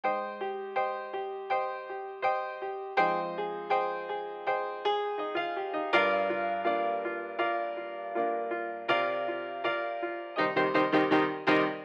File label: old piano intro, Smooth RnB; 081 Cmin